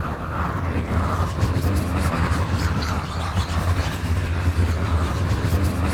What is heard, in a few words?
MURMUR; MURMURING